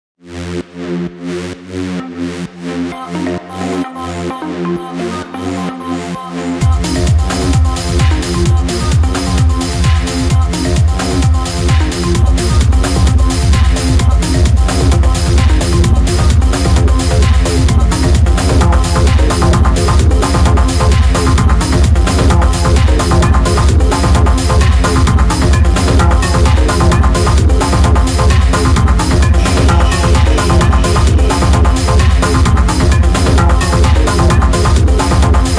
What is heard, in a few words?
Raw Techno